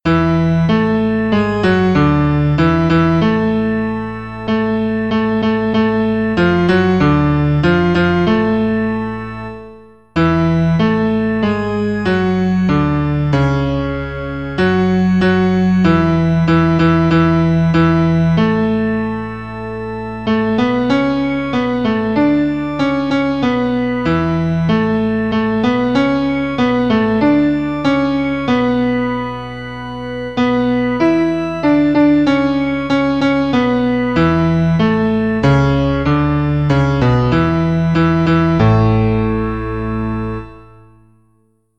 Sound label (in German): Auf Adlers Fluegeln getragen Bass